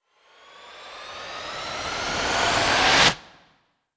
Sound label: Electric Engine; blue archive